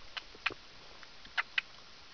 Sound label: clapping; tivoli bay sounds